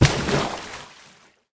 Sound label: airdrop land water